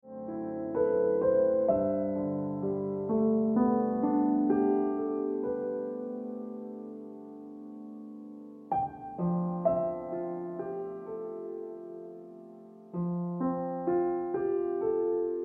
Early Sunshine Ringtone Piano Music

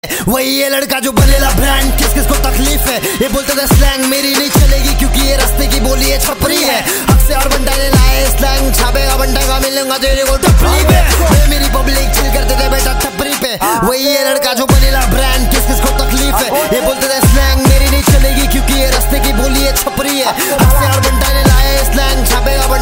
Ringtone

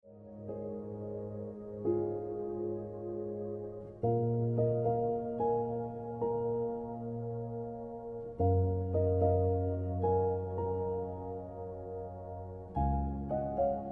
Celestial Ringtone

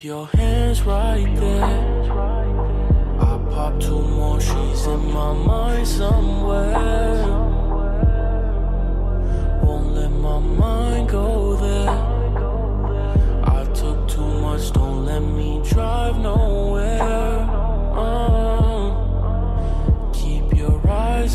Ringtone

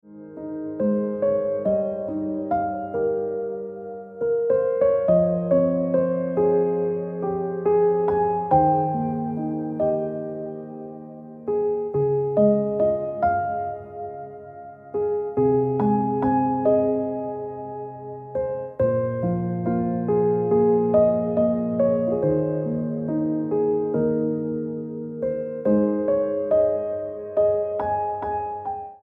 Ringtone Piano Music

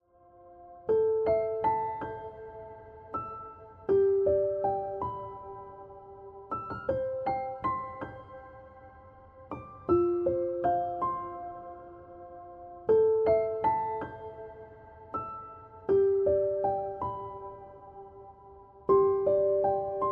Piano Music

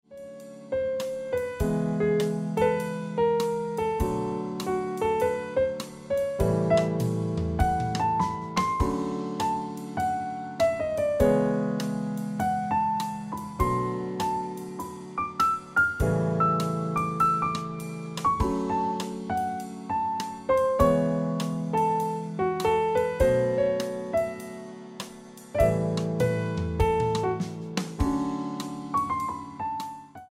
Ringtone

